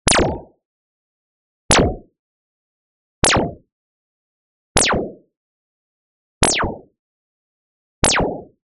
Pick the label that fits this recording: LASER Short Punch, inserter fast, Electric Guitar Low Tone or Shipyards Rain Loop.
LASER Short Punch